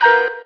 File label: Piano